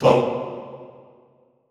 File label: TONG B1, Extended Classical Choir; Best Service; SHORT TONG